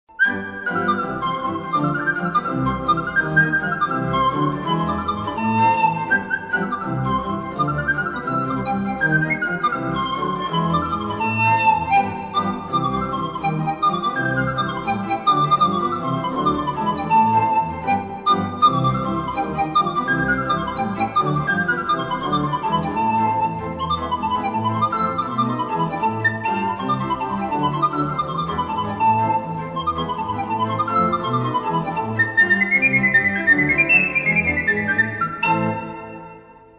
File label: pipes, pan